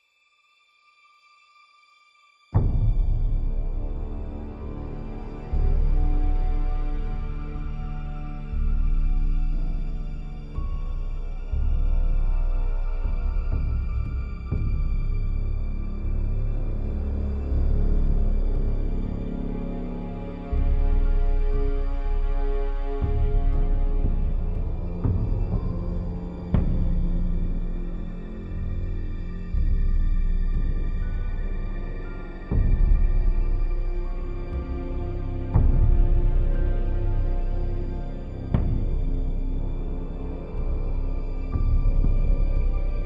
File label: Loop Tense; Game of Thrones: A Telltale Games Series